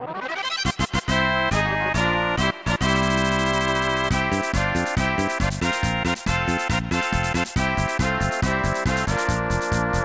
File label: Victory; SMRPG; Victory over Culex